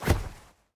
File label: draw, knuk; svencoop; ins2